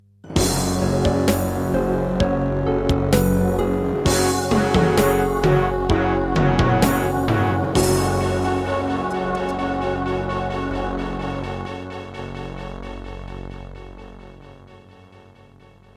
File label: Doom (Sigil) - Introduction [Ast Advantage DBII A]